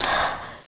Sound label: zombie ghost footstep; ghostfury